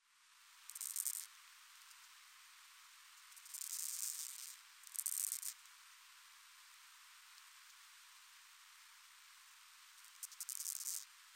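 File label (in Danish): enggræshoppe, Pseudochorthippus parallelus; Rivalsang; Insekter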